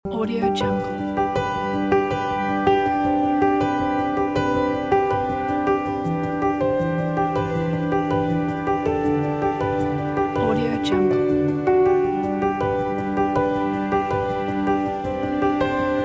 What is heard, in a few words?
inspiring emotional romantic piano